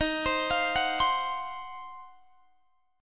D sharp Minor 6th Add 9th D sharp Guitar Standard, chord; arpeggiated; JGuitar